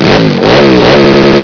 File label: Motor Cycle; psion cd vol1